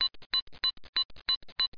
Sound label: BEEP WATC